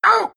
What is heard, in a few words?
pain; Alch